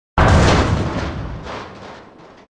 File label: titans punch